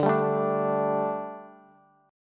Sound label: D Suspended 4th D, down, Bass; strummed